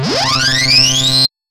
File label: HARDSYNC, synth patch; Soundengine - CSR-000 The Art of Transwaves